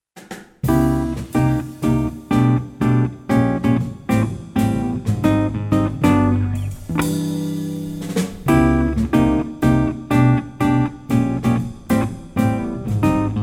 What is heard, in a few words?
Latin Jazz, trio